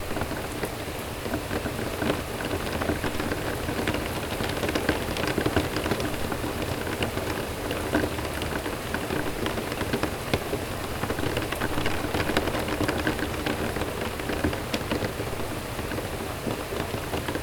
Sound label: Binaural Beats Brain Waves Isochronic Tones